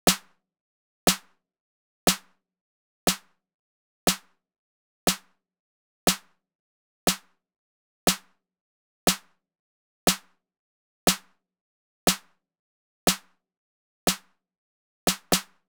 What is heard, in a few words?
snare